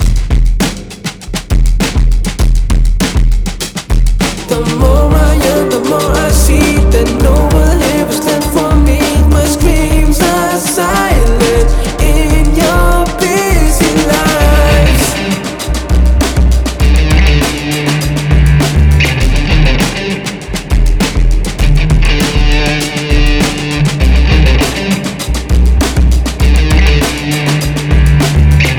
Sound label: Silent Screams